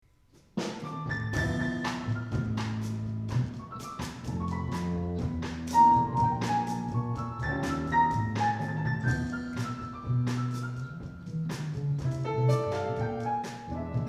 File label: gentle rain